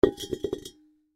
Ceramic Flower Pot, Ceramic Flower Pot Empty Set Down; Ceramic Flower Pot Empty Set Down Ten Inch; Rocks; Foley